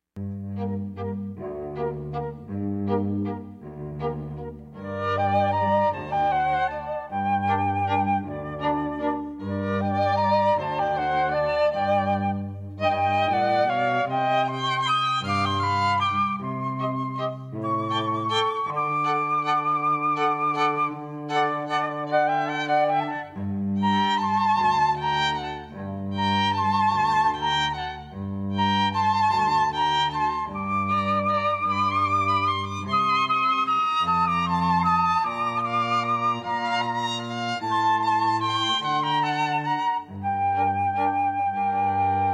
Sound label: Fl Trio